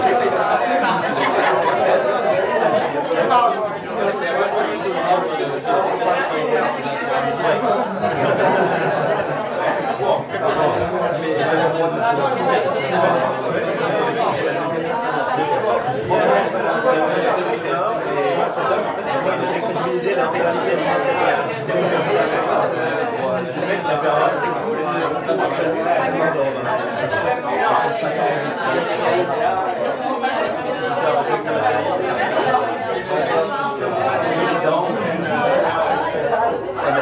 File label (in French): Bruit ambiant; Repas des Anciens au Train Bleu